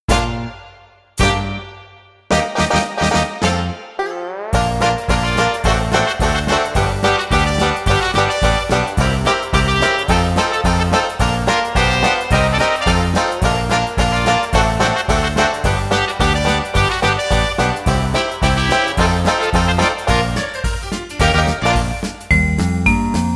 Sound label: instrumental; clowns und bunte luftballons